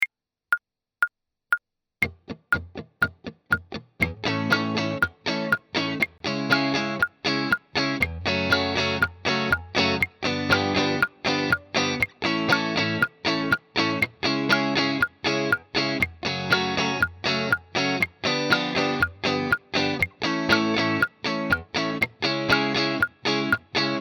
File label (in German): Beispiel Anwendung 120 BPM